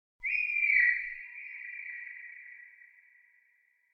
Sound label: Bird Chirp Warble - Reverb; Hollywood Edge; sound designer's toolkit v2